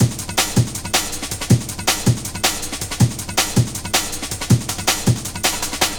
beat, drum & bass beats & breaks; Ebony; Sonic Foundry (Sony Creative Software); Fluid Dynamics Drum & Bass

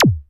Electric Tom, Yamaha DTXpress

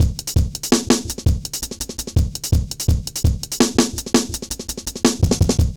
Jazz Shuffle, Drum Loops

